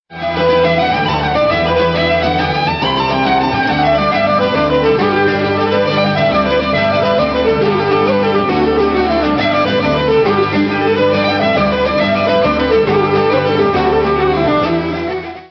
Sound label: Irish Jig